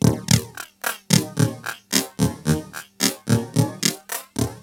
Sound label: LOOP, TECHNO; TECHNO-BOX; ELEC-LOOPS